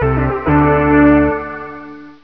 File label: startup, portables tp850